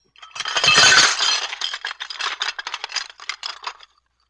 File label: Pickup Dishes Tablecloth; Vintage Cartoon; Foley